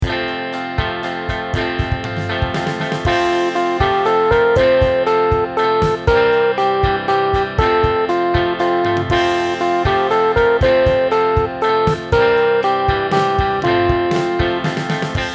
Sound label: ukulele; Rainbow Ukulele